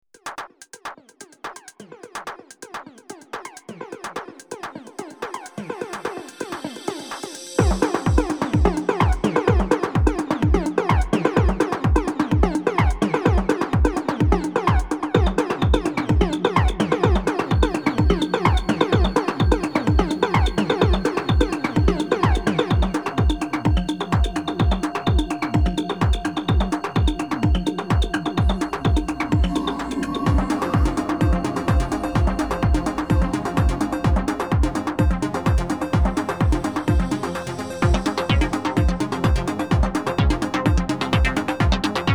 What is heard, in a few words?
techno; RP Blade